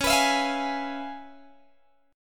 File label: Db 7th Sharp 9th Db down Guitar Open G